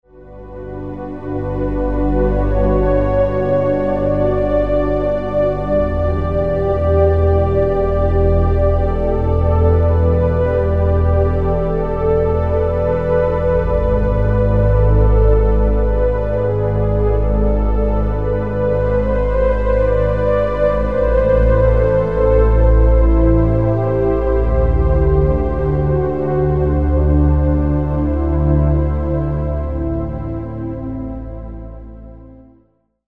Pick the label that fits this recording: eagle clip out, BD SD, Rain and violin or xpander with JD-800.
xpander with JD-800